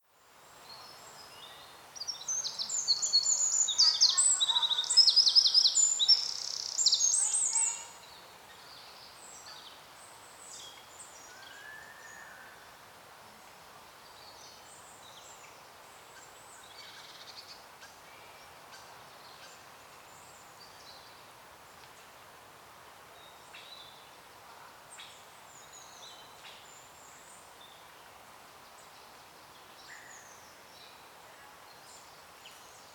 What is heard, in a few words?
Bird, Soundscape